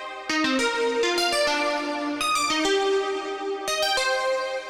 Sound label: Melodies; simple session